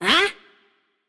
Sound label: nv confused; cupid